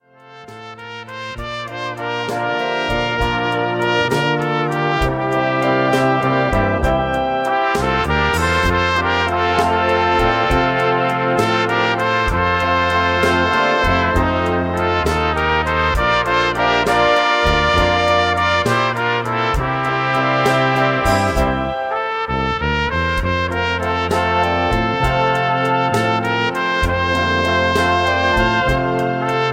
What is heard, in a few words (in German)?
Trompetenzauber